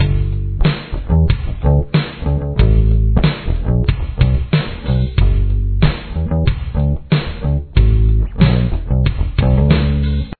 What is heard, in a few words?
bass